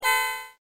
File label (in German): hupe